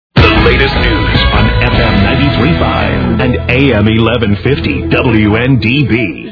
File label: News Sounder; 1150 WNDB AM